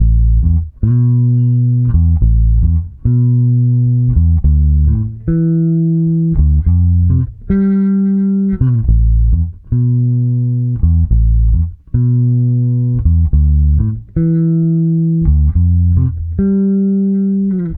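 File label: Electric Bass Dry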